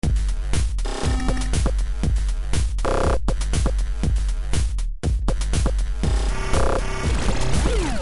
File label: Space Glitch 120bpm 4 bars